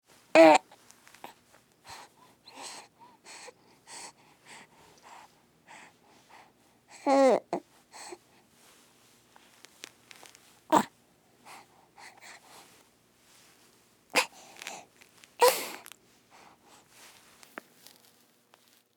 Human Baby Fussing; Babies; Voice

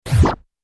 Record Dj Scratch Spin; Record Dj Scratch Spin Short; Musical; Blastwave

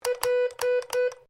MORSE CODE P; Morse code aplphabete; Morse Code (Sound Effects:Electronics)

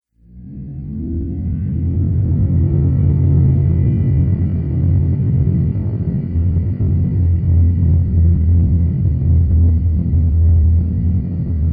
Spaceship Engine Ambience; Spacecraft Sound Effects

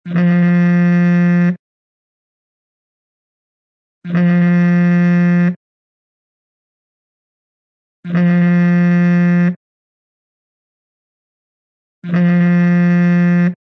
Cell Ph Vibrates

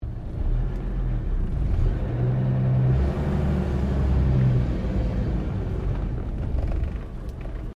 CAR HOLDEN FJ REVERSE, Classic Australian cars; Holden Fj 1955; Vehicles

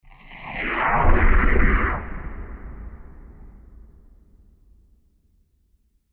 Science Fiction UFO Transition Short

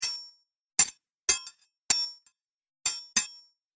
Short Sword Clash Dry; Sword Sound Effects; Fantasy Sound Effect; Andrew Fleming

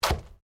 Organic stab; Various Organic Foley sounds; Stab And Slice; Combat

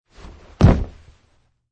Landing

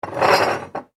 household glass bottle scrape slate board; Large Glass Bottle Scraping Across Slate Board; Materials; McKinney Sound

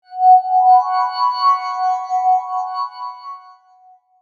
Multimedia Chime, Technology, Multimedia, Chime; Chimes

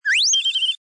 Cartoon Whistles, Tweeting bird melody.; Comedy/Cartoon; Cartoon Sound Design; LynneMusic

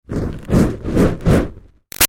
Fire Torch Whoosh Wave Back-Forth; Flames; Objects

